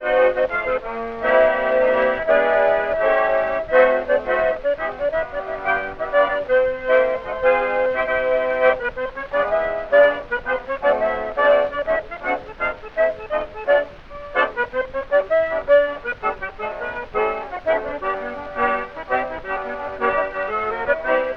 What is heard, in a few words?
saxophone with orchestra; variety stage